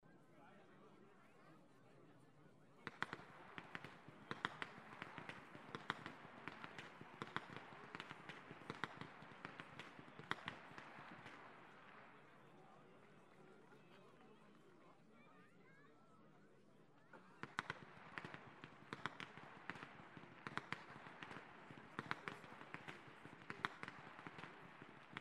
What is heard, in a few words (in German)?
schnalzen, flachgau; STEINDORF III